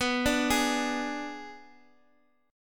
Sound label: B Minor 6th B, Guitar Drop D